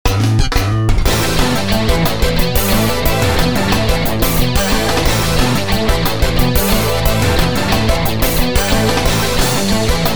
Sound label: Dispatch Preparations; Under Defeat